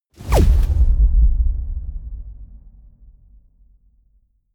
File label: sci-fi plasma gun fire; pistolen gewehre